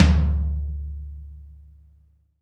Room Tom Lo, Room Drums; Akai MPC500

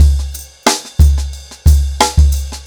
90BPM, ACOUSTIC DRUMS

